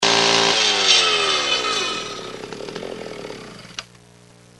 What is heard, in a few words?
chainsaw, machine saw